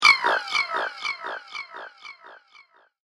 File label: Repeating Reptile Laser Fire; Laser Gun Sound Effects; science fiction sound effect